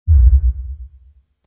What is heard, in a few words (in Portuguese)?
coracao do robo